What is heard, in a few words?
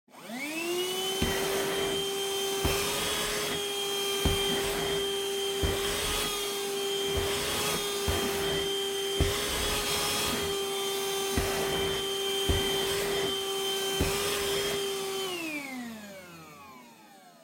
Vacuum Cleaner Vacuum Floor